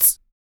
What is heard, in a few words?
HIHAT, MALE PERC; Spectrasonics Vocal Planet; Jazz FX